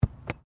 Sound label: RUGBY BALL BOUNCE CATCH; Various rugby sounds; Miscellaneous Sports